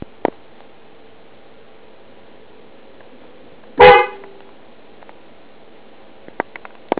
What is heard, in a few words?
horn Hella, garage